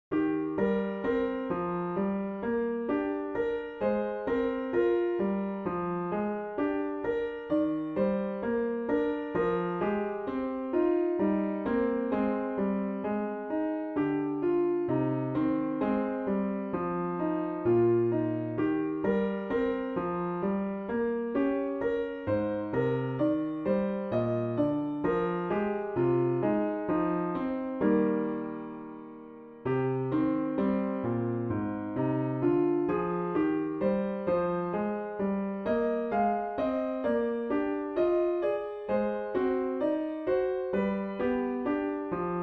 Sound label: spring song piano; miscellaneous piano pieces